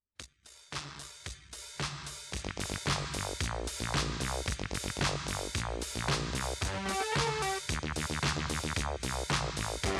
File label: pop synth Slow Melody